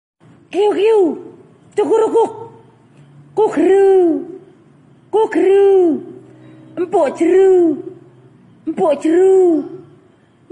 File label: funny